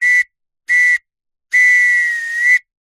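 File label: whistle two tone blow referee soccer close up; ref final; foley; zapsplat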